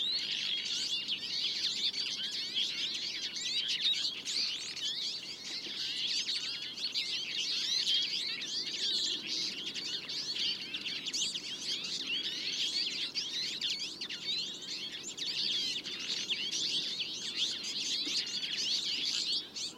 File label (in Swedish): Vinterhämpling